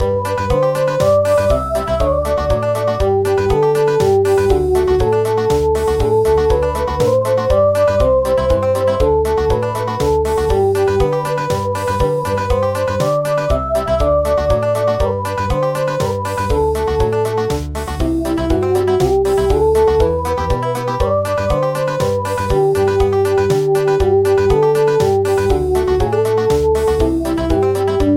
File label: Country; We love the heroes